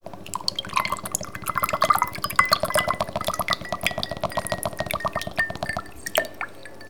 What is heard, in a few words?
Pouring Dense Juice To Glass